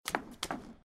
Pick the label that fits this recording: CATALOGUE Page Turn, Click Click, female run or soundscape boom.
female run